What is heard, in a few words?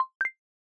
notification, ringtones